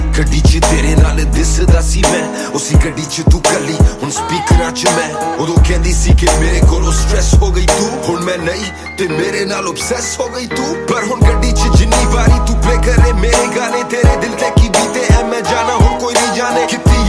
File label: Tik Tok Song Ringtone